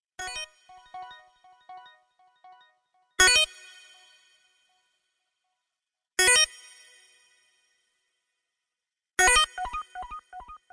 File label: Boingo Pops Ringtone; Ringtone Records